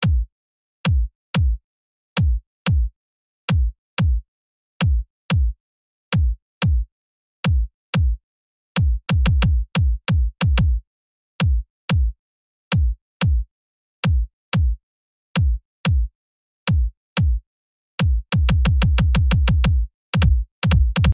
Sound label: kick